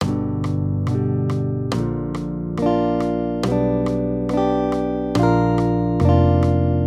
maj p3, Major Triads, Root Movement Three Semitones, Guitar, Accompaniment; G-Bb